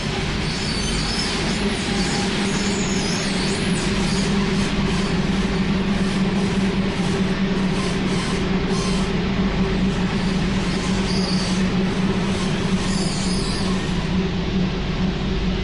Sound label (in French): virage